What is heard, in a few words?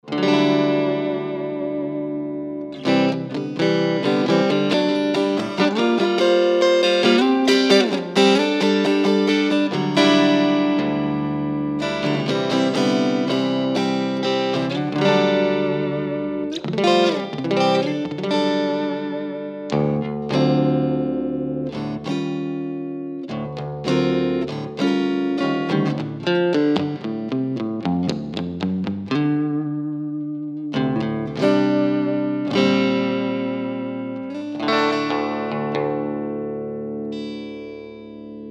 Amp deluxe bypass